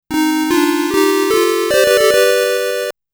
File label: STAGE CLEAR; Makaimura; Ongaku Taizen